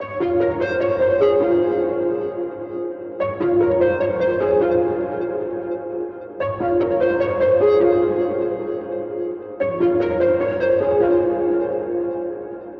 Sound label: keys patch